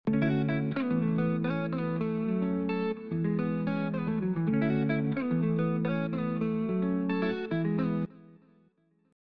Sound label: cool guitar loop